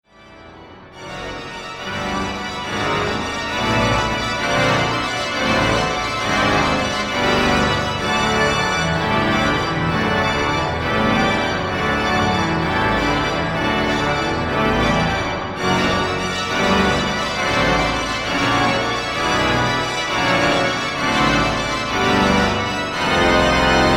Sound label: Carillon; St Sernin; Carillon de West.; Vierne